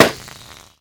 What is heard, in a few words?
pop; World Of Goo